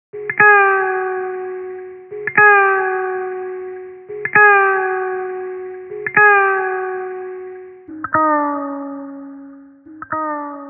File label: loop